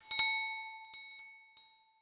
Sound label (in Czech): dvere zvonek; Mafie 1